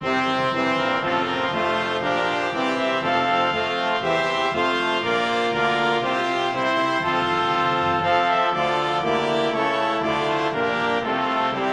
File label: Hymn BRASS